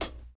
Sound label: dark pedal hi hat sound B key, hihat sample tuned to the B note; hat samples